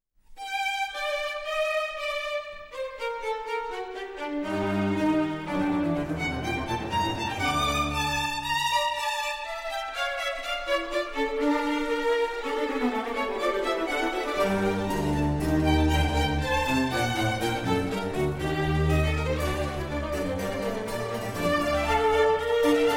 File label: Concerto for Strings and Continuo in G minor, Allegro molto; Vivaldi; 9 Concertos for Strings